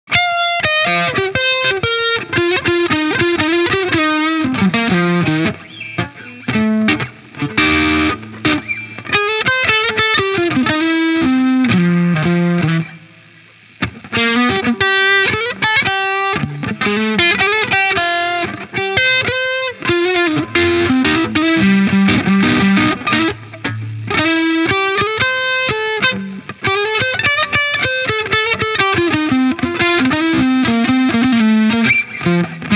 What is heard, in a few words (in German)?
FGN J Standard Odyssey Classic verzerrt Hals